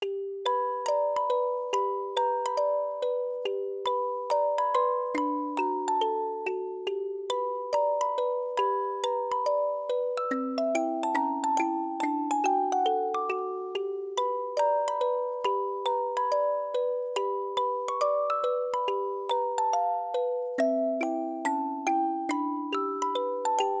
Music Box

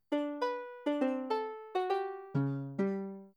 Ukulele

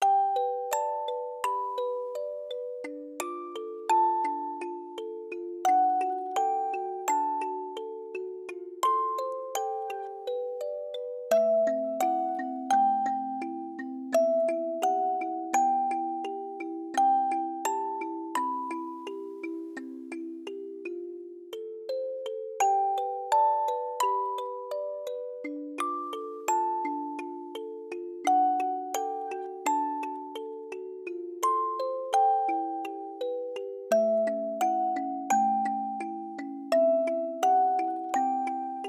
Music Box

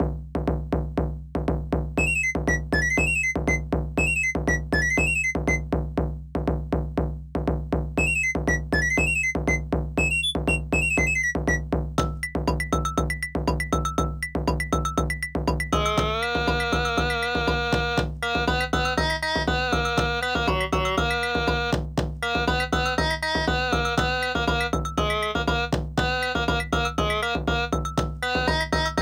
Folk, Aircheck, Retro

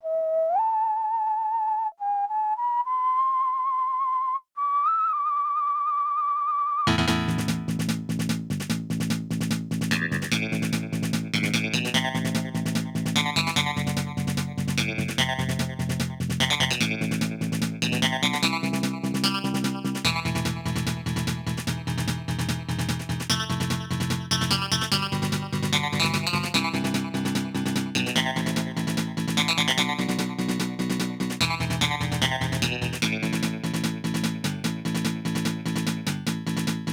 Western, Aircheck, Retro

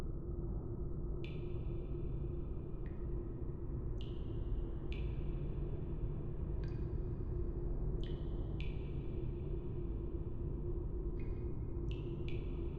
Cave Soundscape

